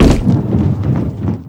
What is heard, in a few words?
death barrel; deaths; brewmaster